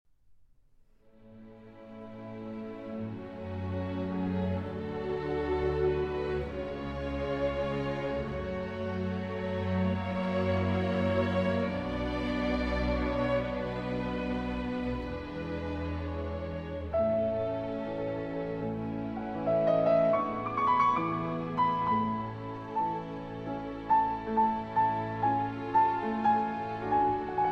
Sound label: Piano Concerto in C-Sharp Minor, Larghetto